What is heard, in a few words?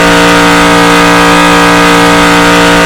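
shelby on high,car engine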